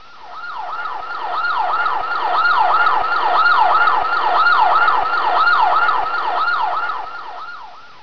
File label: ambulance